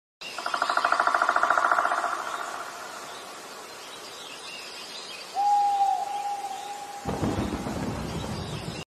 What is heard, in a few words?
Scary background; پس زمینه ترسناک